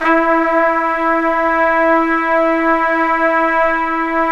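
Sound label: trumpets swell; mp f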